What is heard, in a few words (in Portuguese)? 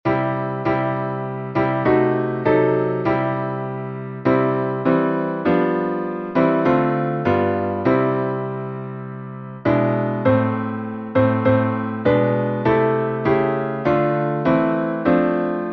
salmo playback